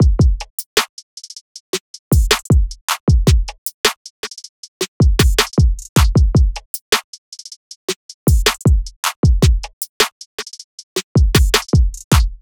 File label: Drum Loop 156bpm